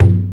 short tom one shot G key, Royality free tom drum one shot tuned to the G note